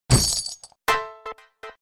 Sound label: Bonus Drop Pouch; slots; Pirate Quest